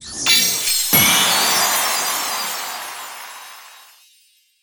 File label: Se Sys Topleague Reward Ranking